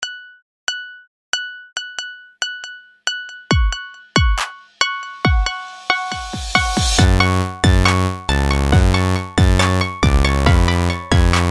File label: music box